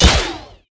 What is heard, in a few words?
Crit